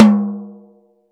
TOM, Drum Dry; East Collexion; Drum 1

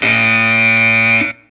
FX Dance

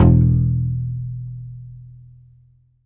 C. Bass Balalaika, Plucked Strings

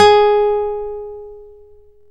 GTR CLS GTR, Nylon String Classical

